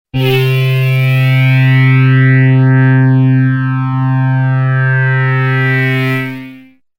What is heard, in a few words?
Hard Sync Saw; synth patches Korg Prologue